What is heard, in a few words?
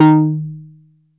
Bass D#3, Prophet Buzz Bass; Oldies